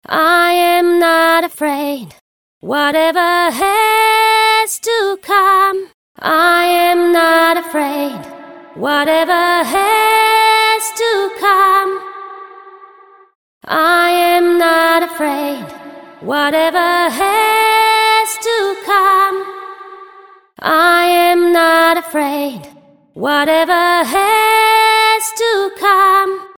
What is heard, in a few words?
Hall first dry